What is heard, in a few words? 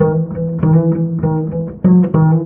bass engine roar, Loops